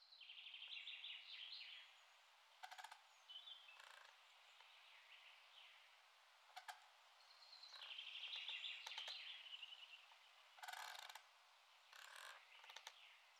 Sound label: creaking tree, MidDay; FontainebleauDemo